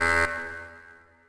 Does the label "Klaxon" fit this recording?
Yes